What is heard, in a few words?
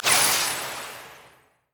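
summonerspell base flash return; LoL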